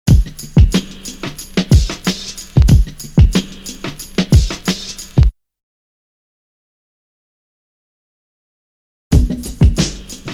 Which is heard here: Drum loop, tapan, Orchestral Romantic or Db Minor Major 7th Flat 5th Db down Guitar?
Drum loop